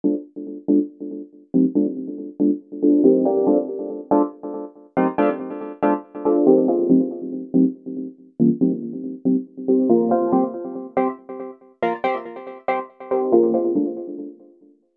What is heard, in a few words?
Grain Chord, V-Synth